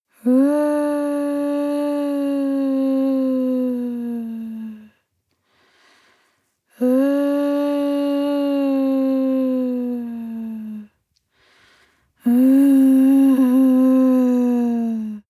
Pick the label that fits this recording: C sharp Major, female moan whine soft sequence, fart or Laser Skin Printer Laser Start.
female moan whine soft sequence